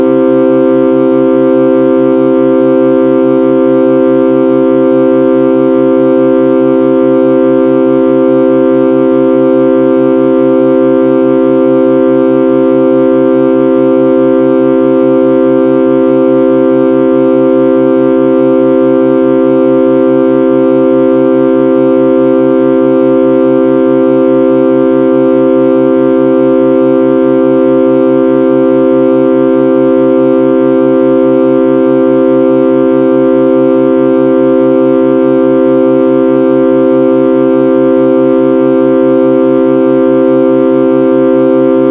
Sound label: C Chord four tones L R